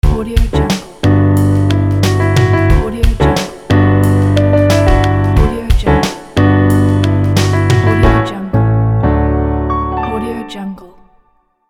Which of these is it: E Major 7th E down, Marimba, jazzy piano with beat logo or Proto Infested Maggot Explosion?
jazzy piano with beat logo